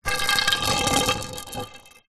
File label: sport pool cue roll hard ground